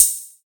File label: crash sample c sharp key